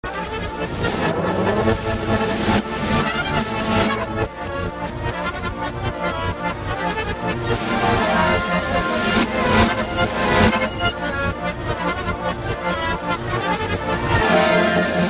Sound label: circus theme music contrario; Cine Circo ao Contrario